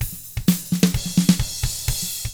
HRDRK, hard rock drums; ILIO; Double Platinum Drums 1

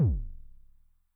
BD MOD [PItch+Depth], 909mod, BASS DRUM; classic machines

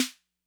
Snare Accent Min Tone Mid Snap, 808; classic machines

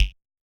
Yamaha DJX 2B, G# Snaps

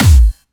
Clubby Kicks, Bassdrums

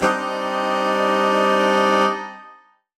Horn Swell Alt Gsus4min6; undercover samples

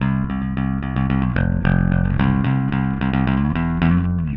Bass, Indie Rock; Session 01 - Brighton; Sonic Foundry (Sony Creative Software); Indie Rock Anthems

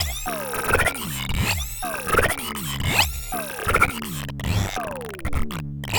Squeaky Static 8 Bar; Sonic Foundry (Sony Creative Software); Ma Ja Le Ethereal Textures; Noise Percussion

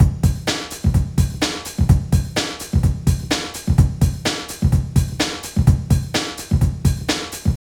LOOP, Tekno Industrial; East West

